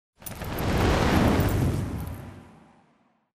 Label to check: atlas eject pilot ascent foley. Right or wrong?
Right